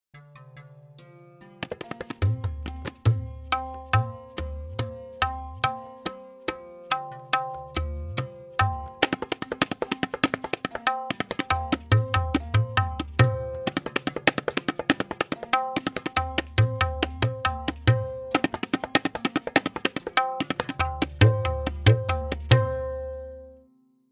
Theka Lehra, tintal mukhra; 4-Matra Mukhra 2; Development

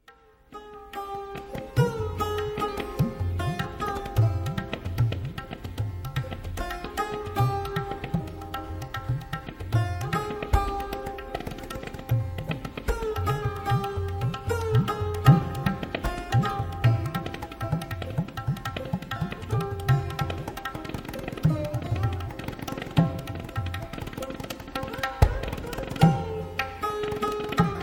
Misra Chandranandan Vil Tintal; Ali Akbar; Mahapurush

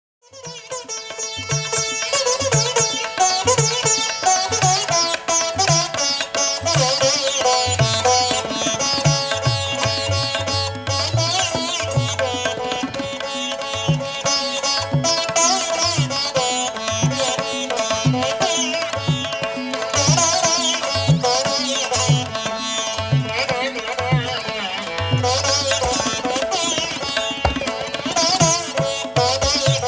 Bhupali End solo, tabla

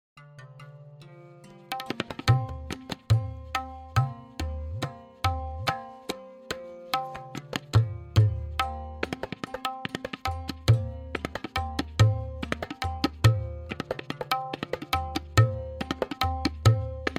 Theka Lehra; Simple Chakradar

